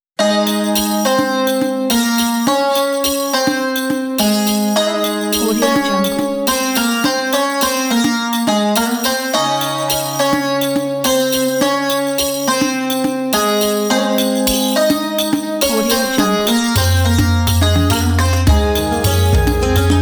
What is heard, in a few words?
turkish